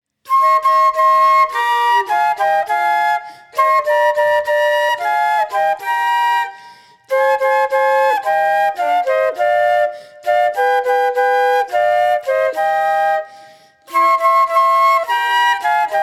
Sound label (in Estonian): flööt